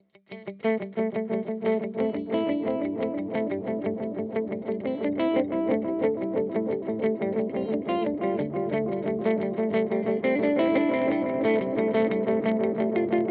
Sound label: JHS Panther Cub V2